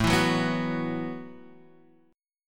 A 6th Suspended 2nd, guitar; A62 Chord {5 7 4 4 7 X} strummed; JGuitar

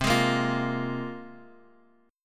C 7th Suspended 4th Sharp 5th, C, down

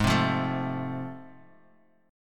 A Minor Major 7th Sharp 5th, Ab

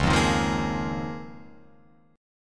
Db 6th Add 9th Db down Guitar Open F; Db6add9 strummed; JGuitar

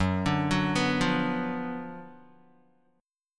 Gb Major 7th Suspended 4th Sharp 5th, Guitar; GbM7sus4#5 arpeggiated; JGuitar

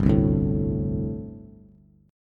G Suspended 2nd Suspended 4th, Bass; Gsus2sus4 strummed; JGuitar

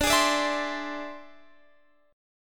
E Augmented 7th D

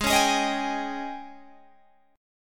Ab Major 7th Suspended 4th Ab down Guitar Open G

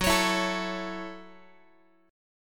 Gb Minor 7th, Guitar; Gbm7; strummed; x,14,16,14,13,x

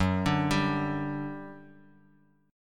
G 5th F sharp, chord; G5/F# Chord {2 5 5 X X X} arpeggiated

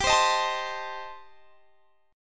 C Minor 6th G, chord

